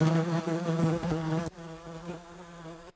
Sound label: erratic bee buzzing and sudden stop; animals